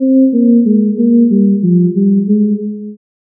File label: sad slowed reverb